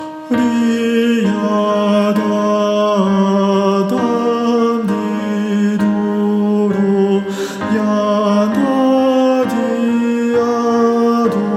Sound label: tenor